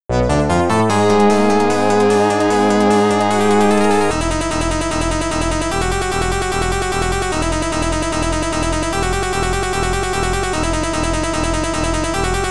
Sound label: Surprise; Dragon Slayer The Legend of Heroes II; FM Towns